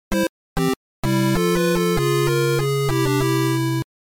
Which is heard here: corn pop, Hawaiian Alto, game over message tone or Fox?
game over message tone